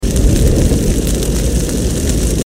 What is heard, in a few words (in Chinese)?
气瓶火烧声; LaboratoryProtection